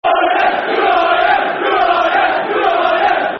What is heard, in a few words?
Who Are Ya, Football Chants; Rangers GRFC